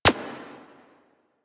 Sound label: Decoy Counter Measure Shot